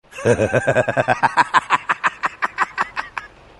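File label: joker laugh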